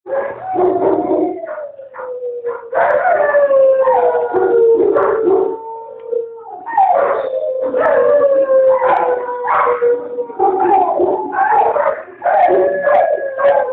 dogs barking

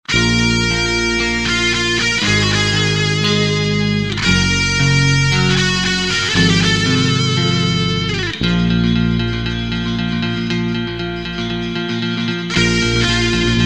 Electric Guita; Zelda

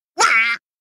WAH; Halloween

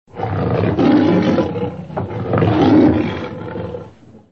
Restless Lion

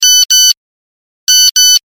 Old Nokia Sms

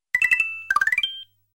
coin

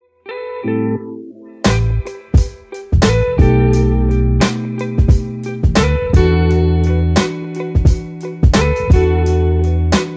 Soulful Lofi

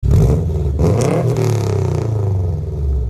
Subaru Revs

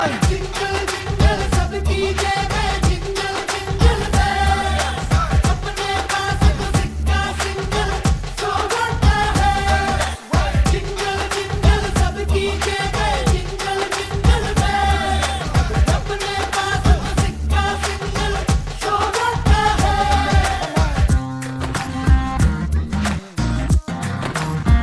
Jingle Jingle

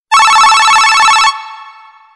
Future Ring

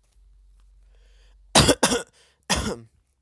Cough stealth

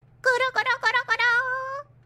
Purr Purr Purr

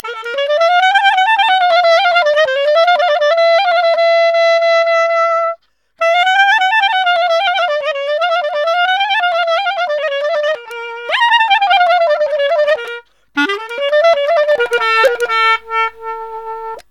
Clarinet

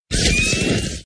Beam Charge; Ironman

